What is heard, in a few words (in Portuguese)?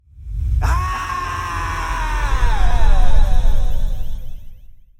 grito